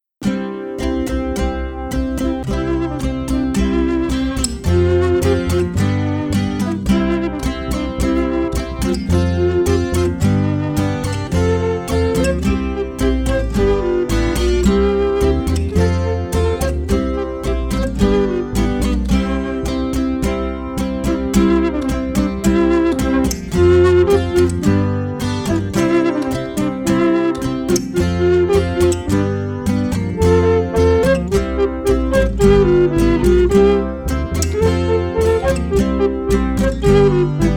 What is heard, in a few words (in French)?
Version instrumentale